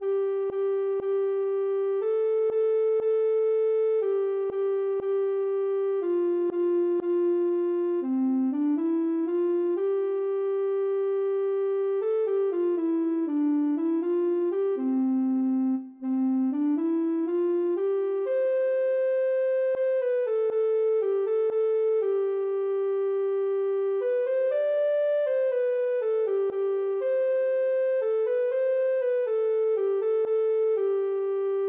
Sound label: lavie, ocarina